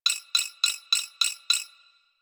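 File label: Hub Computer Beep; Warframe; Tenno Hub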